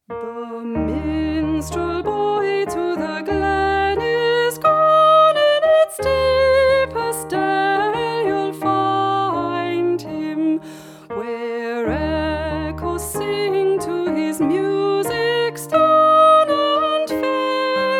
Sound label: Minstrel